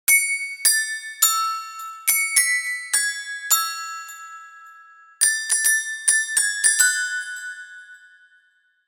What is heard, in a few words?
Phone Bell